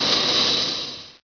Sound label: steam